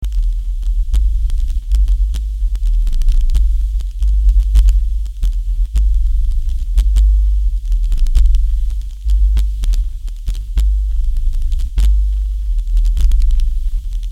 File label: pally breathing background mix